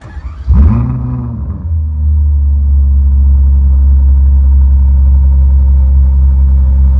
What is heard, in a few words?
idle, vehicles, laferrari, salon; tralalelo ferrari; sim fphys fer laferrari